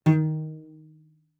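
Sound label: Cello pizz fortissimo sul D Eb4; MIS Pitches - 2014; Strings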